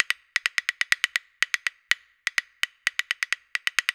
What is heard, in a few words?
PERCS 130BPM; INIS; Opium